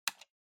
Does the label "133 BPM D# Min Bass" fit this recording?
No